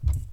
Underneath stairs footsteps; Phasmophobia

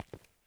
FOOTSTEP Asphalt Trainers Walk Slow mono; Phasmophobia

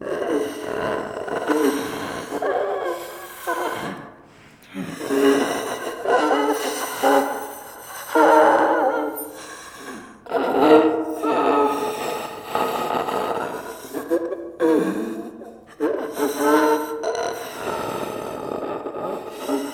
Ghost inhales; Phasmophobia

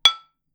glass; Phasmophobia

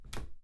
Cabinet Close; Phasmophobia

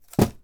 Throwing; Phasmophobia

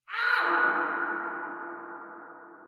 Shout; Phasmophobia

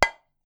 ceramic; Phasmophobia

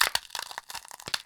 finger crunch; Phasmophobia

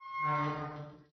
Metal Whine; Phasmophobia

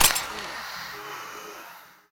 Mirror break; Phasmophobia

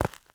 FOOTSTEP Trainers Gravel Compact Run mono; Phasmophobia

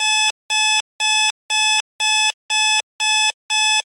Radio alarm buzzer; Phasmophobia

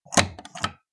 Door handle open; Phasmophobia

